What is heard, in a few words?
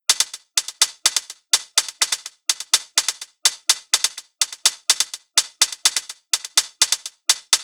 Zippel Beat, dance